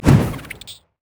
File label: jack in the box; jester